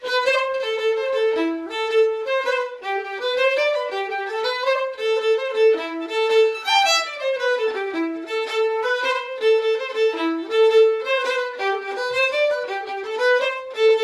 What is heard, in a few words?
Orchestra Ambience; Assassin's Creed III